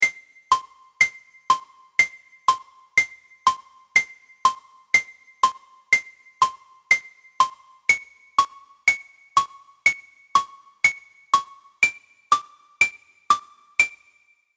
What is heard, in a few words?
Thinking Music